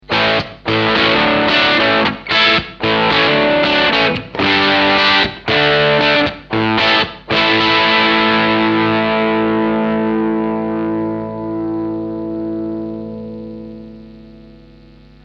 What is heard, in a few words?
crunch